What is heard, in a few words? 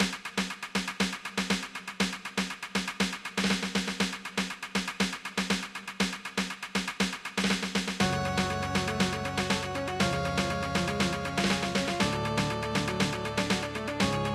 Boss Battle; Elliot Quest; wii u